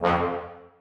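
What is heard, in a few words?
trombones staccato e2; Sonatina Symphonic Orchestra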